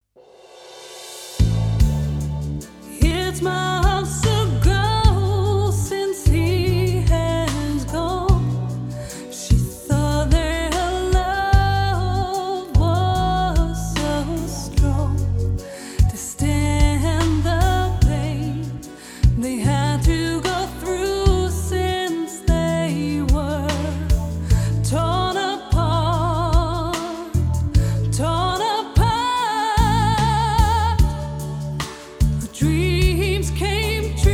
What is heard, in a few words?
Reverb Hofa IQ Reverb